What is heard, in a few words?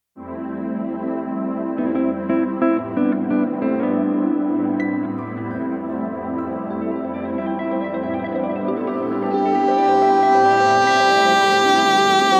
Vocal mix